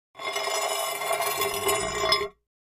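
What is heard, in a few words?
Large Tile Square Scrape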